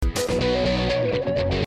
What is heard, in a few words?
Best Msgtone